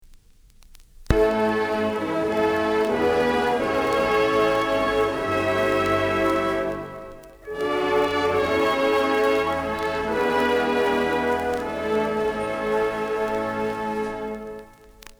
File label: band; Old Miami